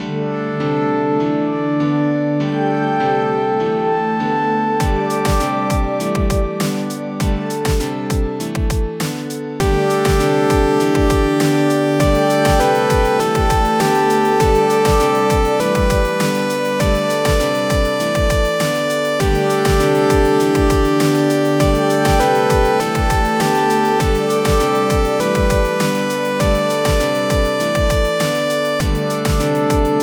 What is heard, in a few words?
Abstract Ambient